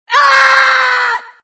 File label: Fem Agony; Extra Lives